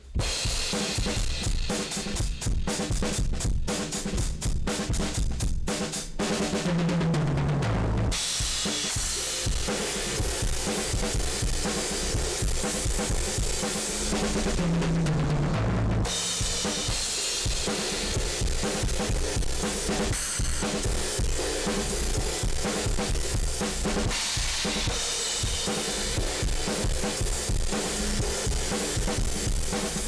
Bus Processor THRESHOLD 25.0 dB MAKE UP GAIN 8.00 dB SATURATION 10.00 TONE off